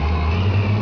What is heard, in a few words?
Wheel Move Off; warzone